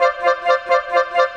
Cinematic Winds; Sonic Foundry (Sony Creative Software); Orchestral Series VOL-3; Cinematic 21

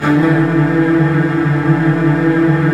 STR WHL TRLL, Virtuoso Strings; ILIO; Kirk Hunter Virtuoso Strings VOL-3

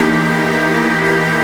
Pad Lofi string, Drum & Bass Pads; Sonic Foundry (Sony Creative Software); Knutrix Drum & Bass

